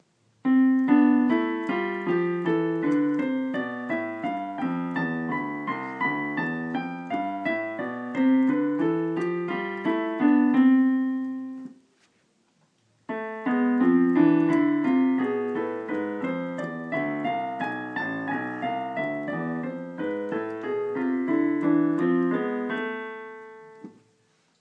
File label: C A minor contrary motion